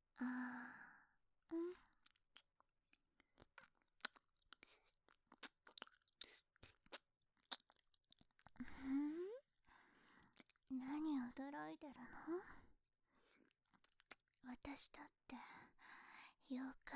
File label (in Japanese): 右耳舐め, ASMR